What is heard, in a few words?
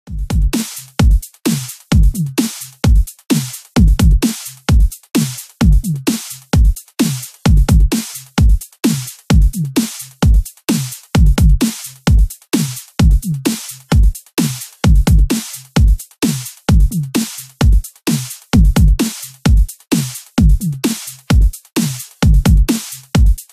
Drummer at work